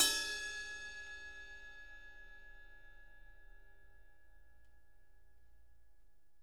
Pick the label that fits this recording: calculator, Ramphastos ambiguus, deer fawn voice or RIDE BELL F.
RIDE BELL F